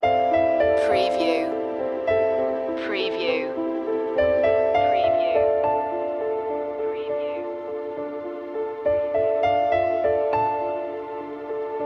autumn piano

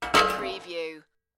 Metal Impact - Drop Metal Pipe On Metal

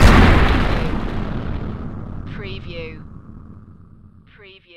EXPLOSION BLAST LARGE DEBRIS; lynne publishing

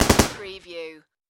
Machine Gun M240B

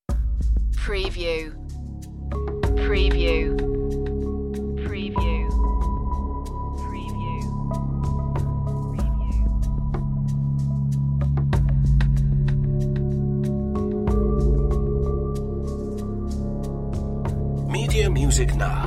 big hollywood dramatic score

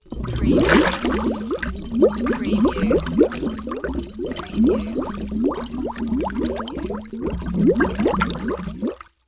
Tank Bubbles

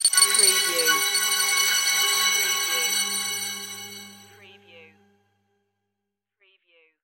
bonus item shimmer; Game Sounds; the sound pack tree

